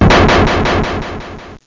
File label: game over; JEWELS